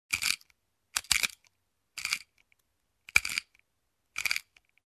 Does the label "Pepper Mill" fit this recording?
Yes